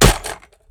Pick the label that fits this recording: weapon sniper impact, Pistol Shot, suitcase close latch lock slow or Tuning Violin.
weapon sniper impact